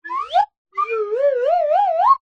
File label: cartoon ascend slide whistle float up